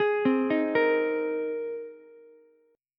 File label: A sharp 7th Suspended 2nd Suspended 4th, Ukulele chord; arpeggiated; JGuitar